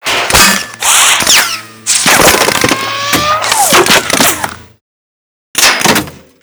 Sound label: Hedge Impacts